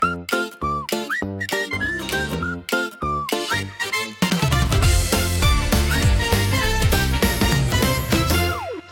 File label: jingle funny; 効果音・ジングル(ショートBGM)